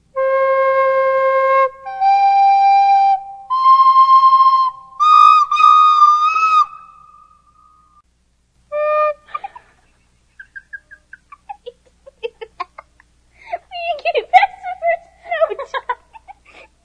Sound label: flute